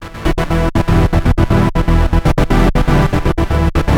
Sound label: Trance Synth; Sonic Foundry (Sony Creative Software); Trance NRG VOL-2; Trance Synths